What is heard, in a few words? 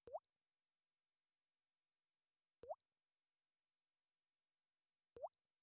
Single Water Drop Loop; the-ruined-city